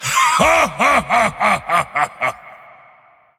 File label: laugh; Volibear